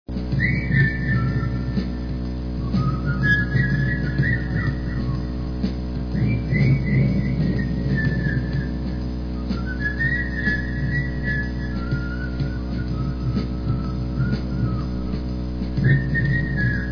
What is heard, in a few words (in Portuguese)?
assobio